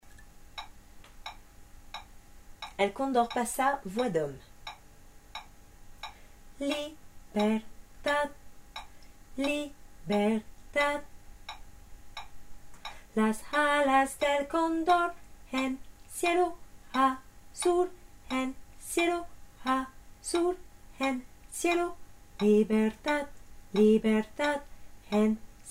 el condor pasa hommes

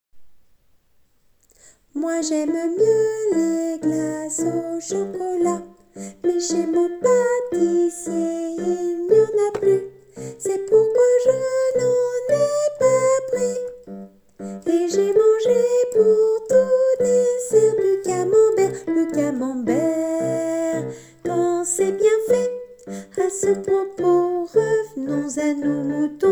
aragon et castille hommes

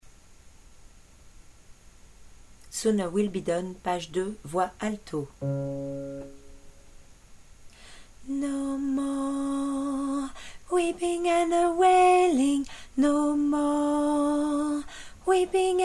alto

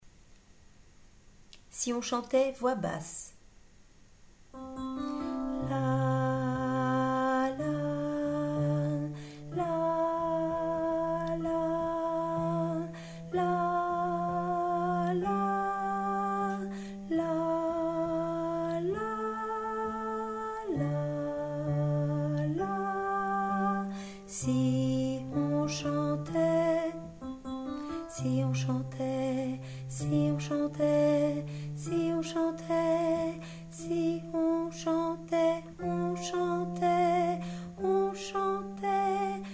basse

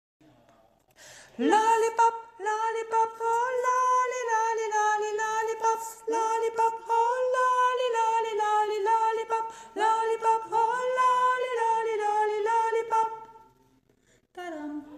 voix filles haute

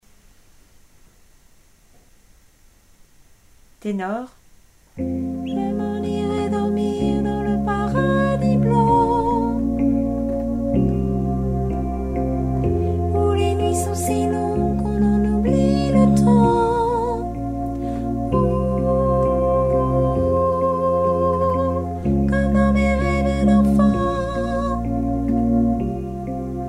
tenor

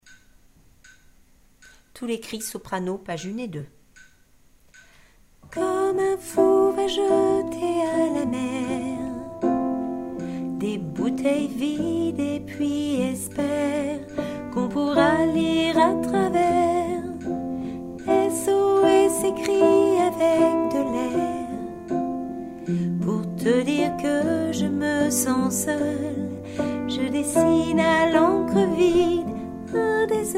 soprano

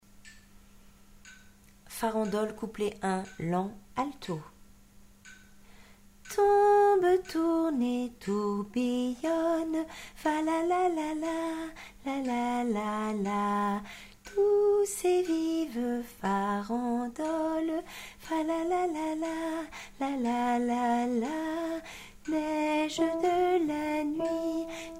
farandole lent alto

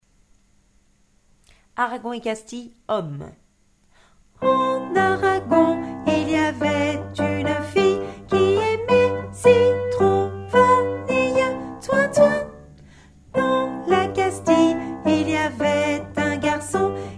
hommes

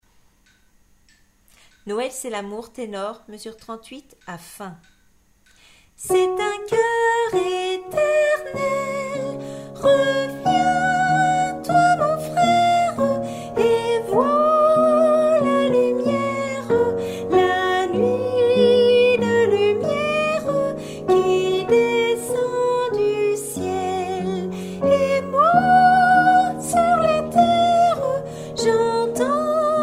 tenor

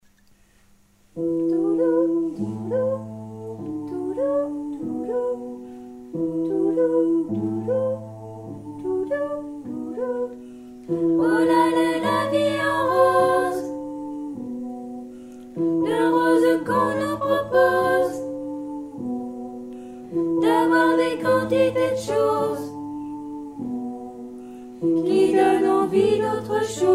3 voix

